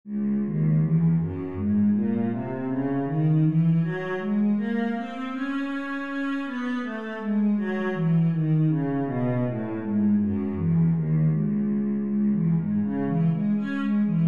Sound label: cello, c sharp minor